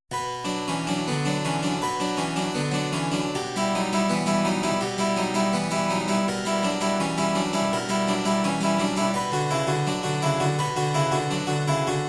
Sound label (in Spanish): Fuga Clavecín